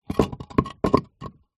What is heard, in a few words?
foley concrete block heavy move roll concrete ground